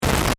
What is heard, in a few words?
Distortion Crackle; BlastWave